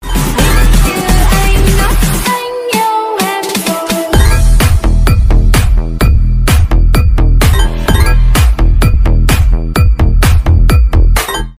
Chinese Melody Ringtone

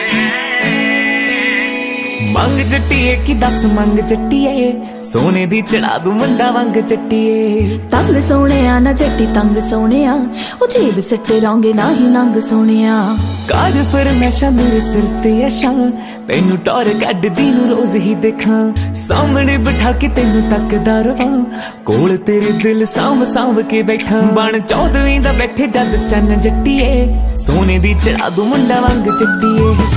Punjabi Ringtone

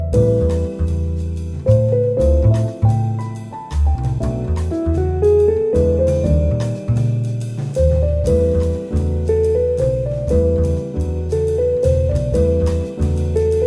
Soft Ringtone; Vivo

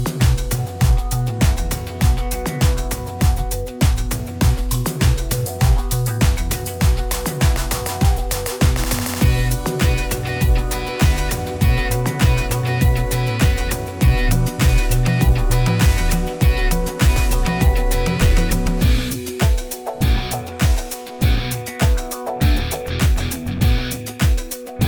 Huawei Ringtone; Huawei Y7 Prime

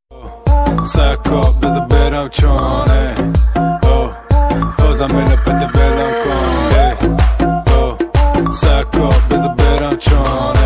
best english instrumental ringtones

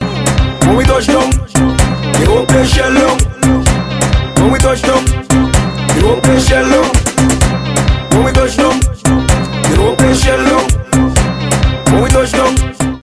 Ringtone

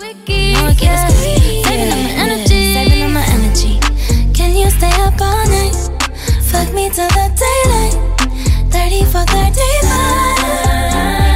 english ringtone

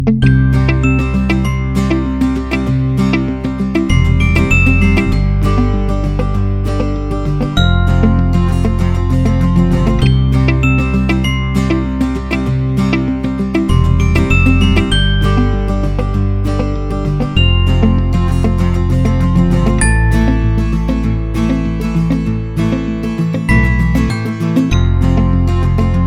Vivo X50 Ringtone

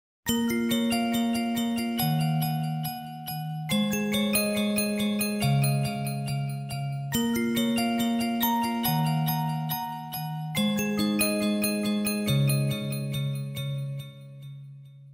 Oppo Nostalgic Original Ringtone

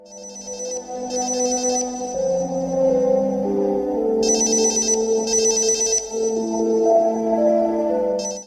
Cool Abstract Ringtone

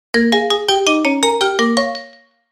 Htc Default Ringtone

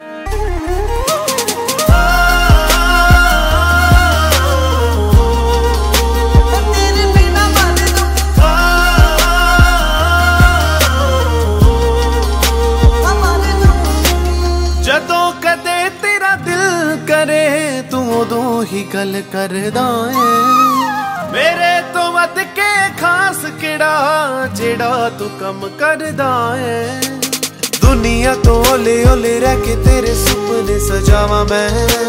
sad Punjabi song

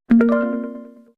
Notification; Reno4 Pro

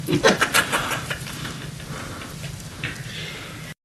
Laugh Ringtone

